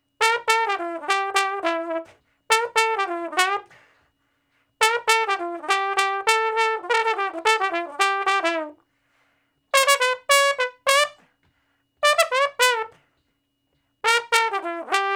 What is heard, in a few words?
Trombone Shuffle (E); Sonic Foundry (Sony Creative Software); Horncraft for R&B; Solos